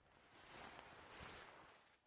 Player Wakeup Sit; MiSide

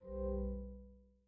Quest Start; Game rip; MiSide

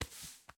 Hand Put; Game rip; MiSide

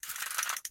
Cartridge Dismantling Bolt; MiSide

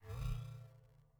Ring Move; Game rip; MiSide

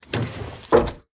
Shield Up; Game rip; MiSide

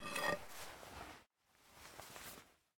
Bat Take; Game rip; MiSide

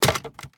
Cartridge Fall; Game rip; MiSide

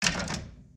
Lamp Start Move; MiSide

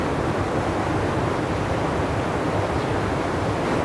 Wind Object; MiSide

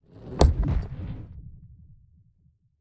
Wire Fall; Game rip; MiSide

